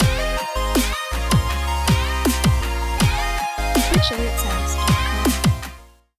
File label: Dubstep Host